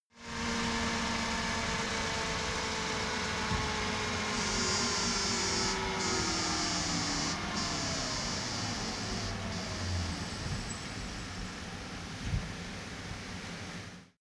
motorcycle harley engine howl descend

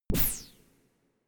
space laser gun double shot empty; krieg-waffen; soundpool

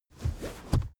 car armrest backseat open fast

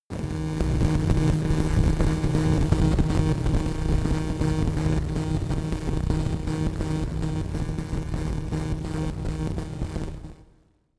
static crackle noise long, sciene fiction

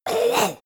monster gasp; kreaturen

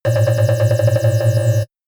shot ringing wobble chirp

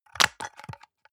soap dispenser press almost empty

